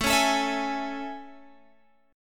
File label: A Major 7th A, Guitar, Open G